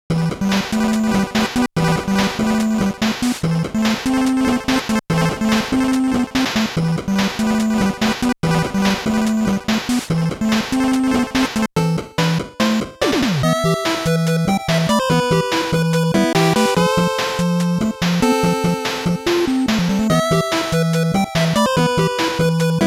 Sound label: Sewage